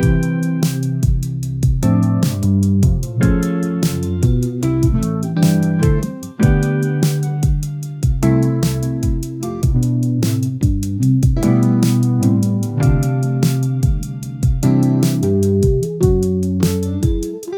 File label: instrumental change